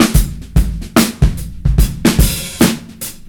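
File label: drum, 073, Breakbeat; Best Service ProSamples vol.40 - Breakbeat 2; AKAI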